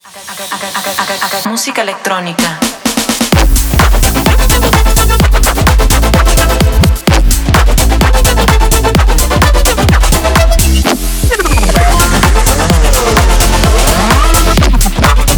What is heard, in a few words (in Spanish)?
musica electronica